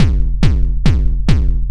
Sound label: Drum loop 140 Bpm Edm Hard Kick Loop